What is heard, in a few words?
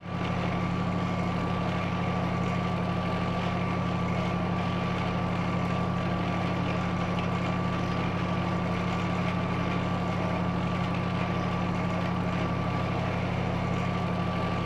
submarines engines; World Of Warships